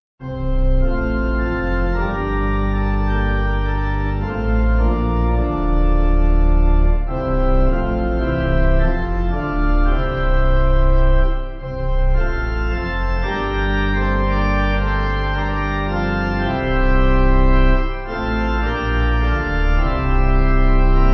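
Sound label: pipe organ; They Cast Their Nets; Georgetown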